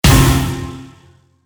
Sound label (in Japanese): ガチャ インパクト